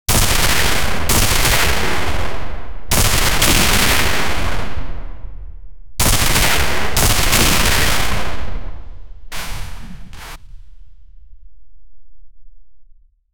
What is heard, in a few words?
intense plasma gunfire; energy burst